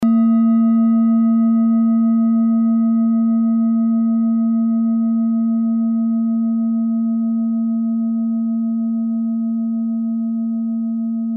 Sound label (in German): klangschale set; Klangschalen-Chakraset; Gewicht = 790g; Durchmesser = 16,9cm; Höhe = 8,5cm